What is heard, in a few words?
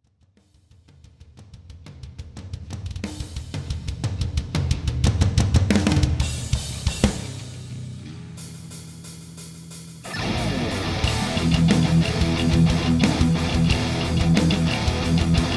MOOER GE200 METAL TONE; FREE METAL TONE PACK